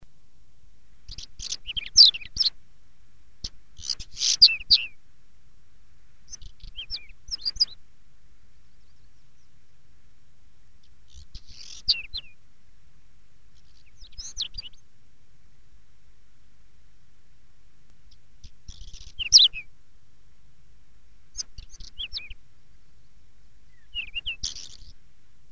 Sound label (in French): Tarier, Oiseaux; Sons Oiseaux